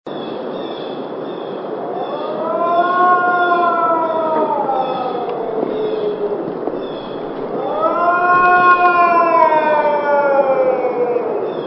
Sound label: dog howl moan